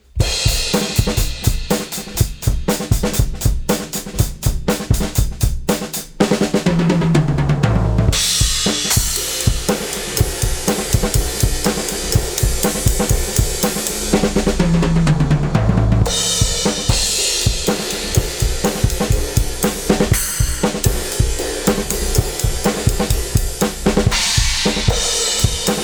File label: drums; Pulsar 1178; Drum Bus MS Glue